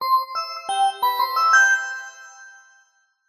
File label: multimedia alert notification bright organ bell like musical positive